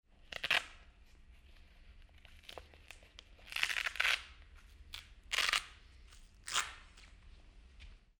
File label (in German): Binaural Klettband